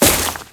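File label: Crewman Step Vegetation, Footsteps; Warframe; Corpus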